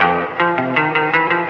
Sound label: guitar, Loops Phonky